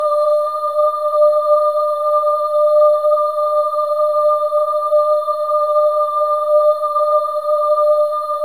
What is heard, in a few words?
SOLO BOY, AH; Spectrasonics; Symphony of Voices